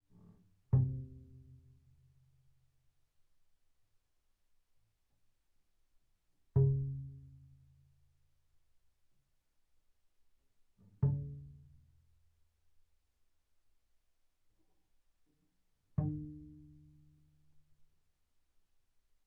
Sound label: Bass pizz sul A mf C3 Ab3, double bass